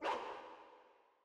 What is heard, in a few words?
dog; musicbox